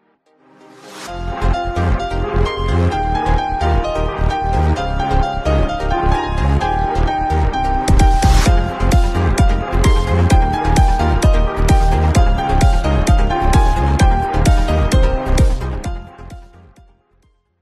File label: samsung j7 plus ringtone